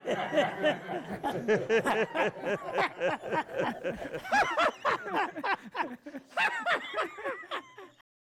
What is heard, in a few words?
Laughing; Archbishop Tutu; Dalai Lama; MissionJOY